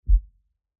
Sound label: hover off